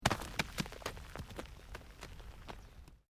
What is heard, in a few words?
ROCK TUMBLING; Material components for constructing more complex sounds; Rocks; Materials